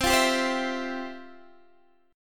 F Major 7th Suspended 2nd, chord

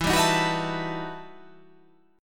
E Minor Major 11th E

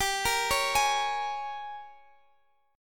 G sharp Major 7th Suspended 2nd Suspended 4th

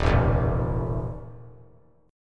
Eb Suspended 2nd Flat 5th Eb 3C 3F 3A 3D; Ebsus2b5 strummed; JGuitar

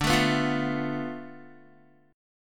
D Minor 6th Guitar; strummed; 9,12,11,10,9,x; 4F,4A,5C,5G,5C,6E

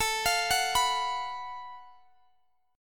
Gb Minor Major 7th Double Flat 5th A, guitar

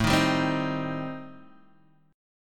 A-Minor 7th Flat 5th, Guitar Open G, chord

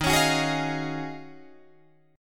Eb Minor 11th Eb down Guitar Drop D; Ebm11 strummed

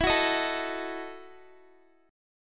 E Major 7th Suspended 2nd Suspended 4th, Guitar; EM7sus2sus4 strummed

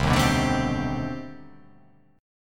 C Minor Major 13th, chord

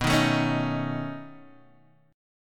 B 9th B down Guitar DADGAD

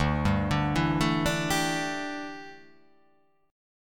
D Suspended 2nd Suspended 4th D down, chord; arpeggiated

